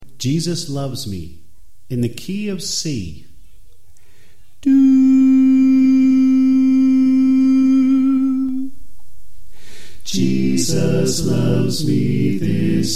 Alto Left

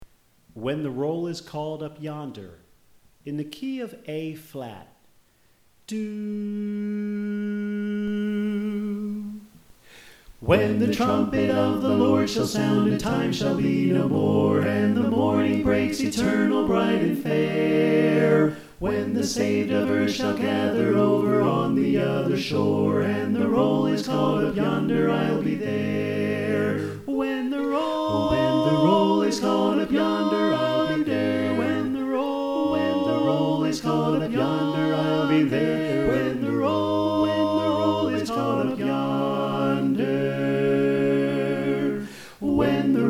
Soprano Left

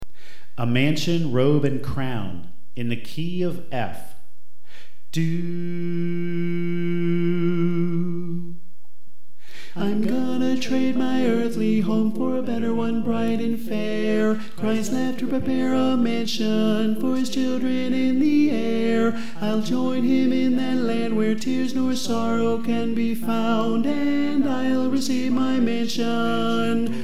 Tenor

